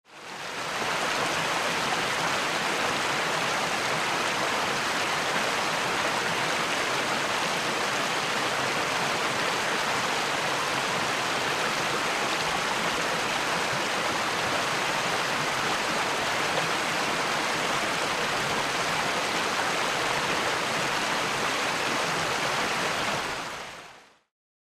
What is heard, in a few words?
Small Stream Flowing